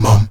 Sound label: VOX MUM G#2E, Afro Chants, Stacc Mums; Roland LCDP11 Africa VOL-1